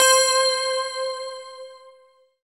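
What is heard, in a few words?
synthesizer clear, digital; Sound & Vision - Gigapack I CD 2 (Roland)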